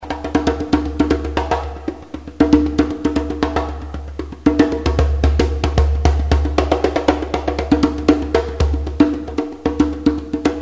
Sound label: djembe